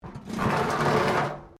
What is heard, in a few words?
METAL BIN SCRAPE CONCRETE; Metal (Sound Effects:Materials)